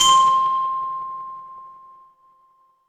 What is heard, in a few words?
instrument chime bar